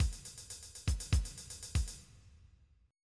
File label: jazz rock